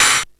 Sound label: METAL, ELEC PRCS; East West; Global Sounds